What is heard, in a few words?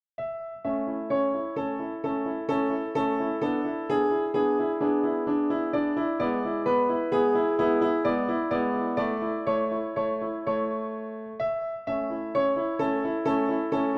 Dance PianoTeq Bechstein